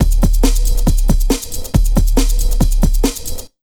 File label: Big Beat Drumloops, 138 BT